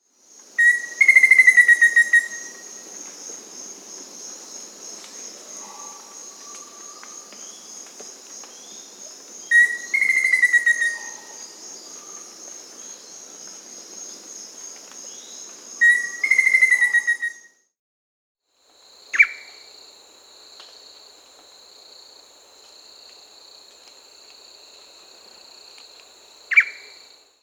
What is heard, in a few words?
Black-faced Antthrush, Formicarius, Nature's Songs, S. America